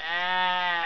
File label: SHEEP